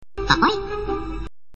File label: toy; minion